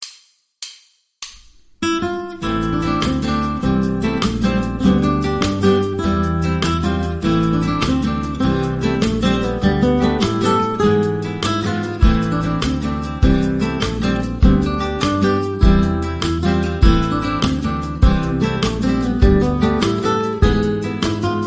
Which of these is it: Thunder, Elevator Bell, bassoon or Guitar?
Guitar